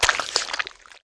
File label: STOMP; mahlendur